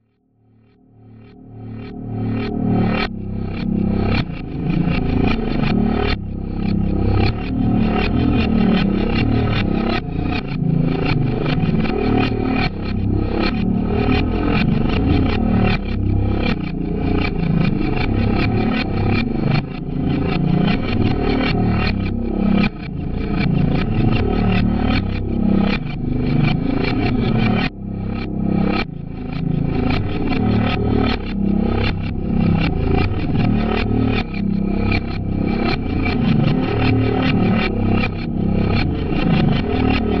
verse 3 intro reversed ionosphere stem, 156BPM; Pony Fan Music Remix Stems

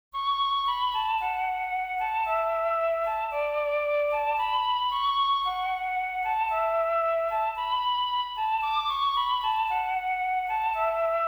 mellotronnnnn

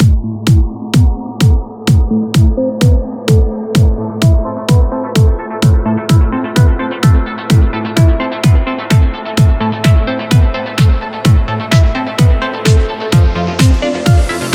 Violin Melody

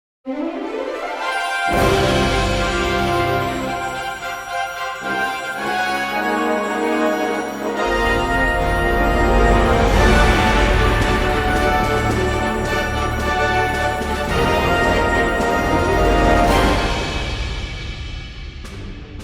Grand Orchestra

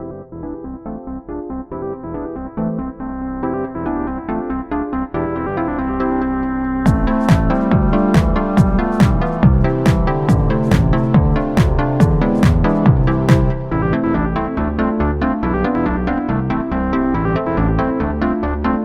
Remix Instrumental

